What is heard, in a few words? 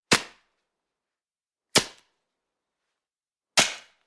rubber glove snapping series